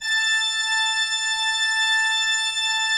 A5, cathedral organ; Propeller Island

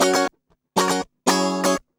120 BPM

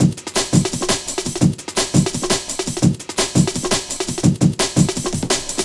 Drum & Bass Beats & Breaks; Sonic Foundry (Sony Creative Software) - Fluid Dynamics Drum & Bass

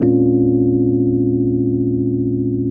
RHODES; Houseworx

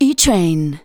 vox, Techno Trance; Ueberschall

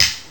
HC-2 Clap, Boss HC-2; 300 Drum Machines

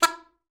E4, alto sax; Xsample VOL-2

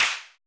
rim, Roland MC-909

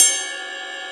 ride bell

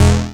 Industrial Analog Single Notes Short; Sonic Foundry (Sony Creative Software)

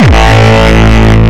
Longkick, kicks

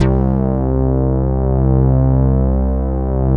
SYN RESO, Wavestation; Sound & Vision - Gigapack I CD 2

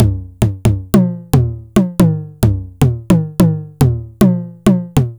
Roland Tr-909 hi tom

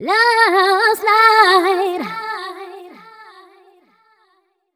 f 128 mel e, fem Vocals

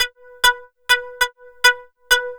SYNT, funk

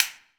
clap stick; AKAI S-Series CD-ROM Sound Library VOL-5

